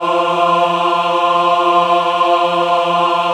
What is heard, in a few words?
F3 MW AH, Choir; USB Soundscan vol.28; Acoustic & Synth; AKAI